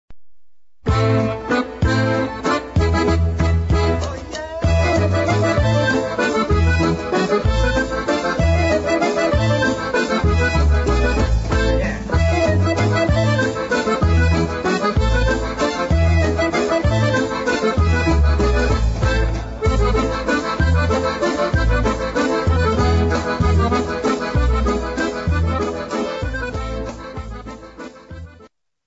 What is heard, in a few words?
mazur, polkas